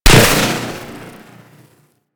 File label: Space Drone Bomb Explode, weapons; Grn Space Drone